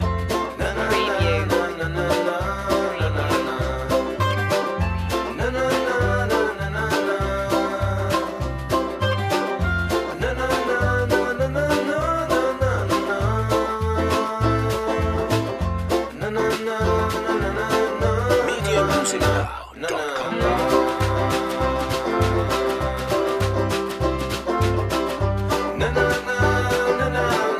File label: happy western loop